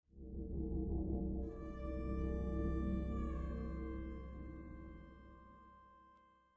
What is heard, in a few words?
Didgeridoo; Lethal Company